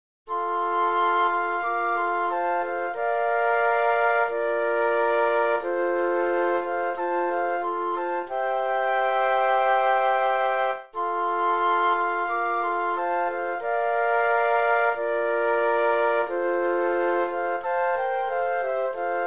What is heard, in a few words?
Flute Quartet